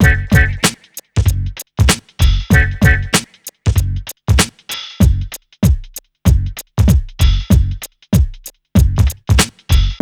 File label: Hip Hop R n B mix; USB Soundscan vol.19; AKAI